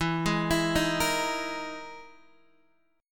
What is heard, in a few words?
E Major 7th Flat 5th E down Guitar DADGAD